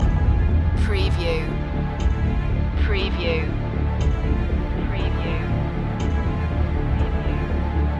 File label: the showdown trailer loop